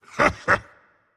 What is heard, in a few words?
heh heh rage; chaac